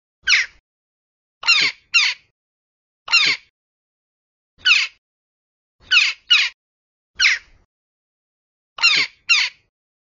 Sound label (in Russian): golos galki